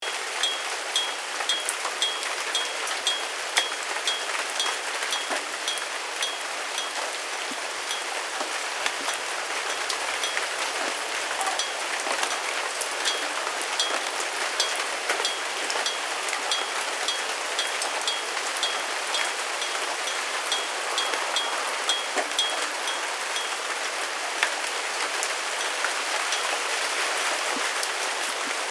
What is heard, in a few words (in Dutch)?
zomerregen; ringtone